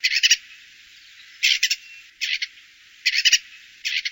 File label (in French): etourneau